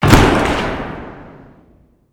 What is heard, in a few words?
Lights Shut off